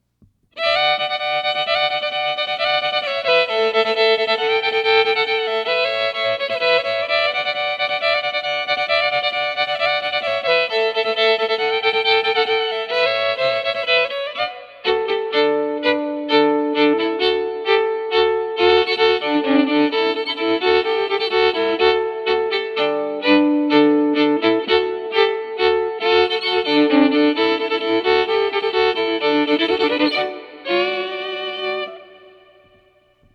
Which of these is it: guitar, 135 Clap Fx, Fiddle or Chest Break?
Fiddle